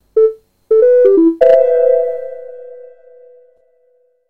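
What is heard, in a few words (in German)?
Flughafen Köln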